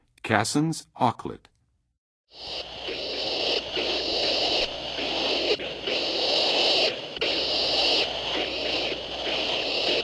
Cassins Auklet; North America; Western USA; Western USA Songbirds

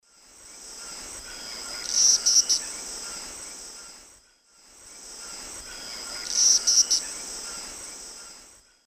Tachornis squamata, call; S. America; Eastern Lowlands

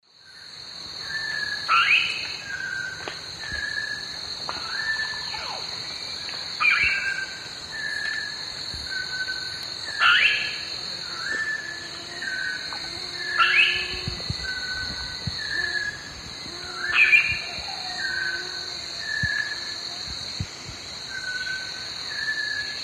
Pionopsitta barrabandi, calls; S. America; Eastern Lowlands

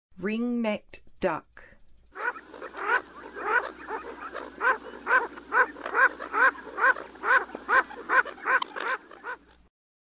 Ring-necked Duck; North America; Western USA; Western USA Songbirds

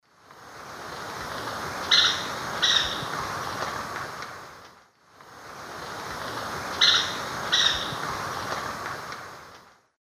Attila spadiceus, alarm call; S. America; Eastern Lowlands

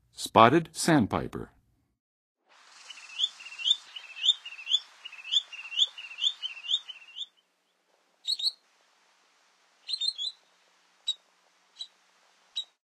Spotted Sandpiper, Birds of North America; Western USA; Western USA Songbirds

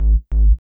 Bass, RnB

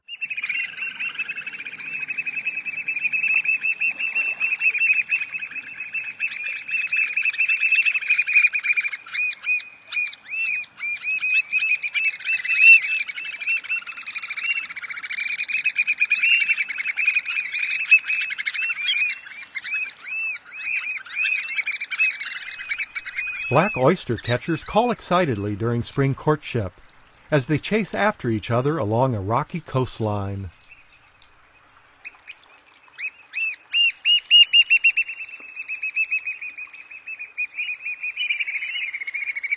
Black Oystercatcher; North America; Common Western Species; Know Your Bird Sounds

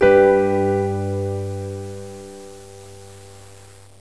PNO; MUSIC; INSTRMNT

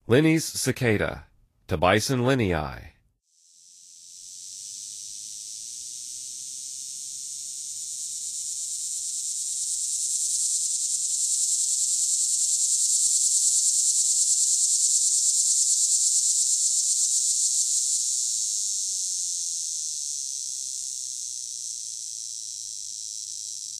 Linne's Cicada; N. America

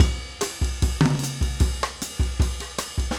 samba 150

